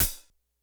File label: hat, closed hats